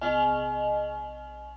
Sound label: chime